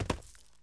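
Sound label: land medium, dirt foot; The Elder Scrolls III - Morrowind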